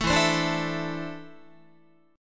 E 7th Suspended 4th Sharp 5th E down Guitar 4A 4E 5A 5D 5G sharp 6C sharp; strummed